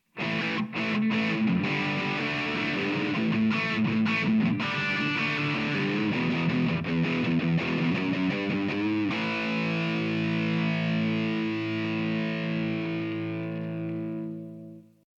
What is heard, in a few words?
Les Paul hard rock rhythm bridge; Harald König; Blue Note Gitarren Amps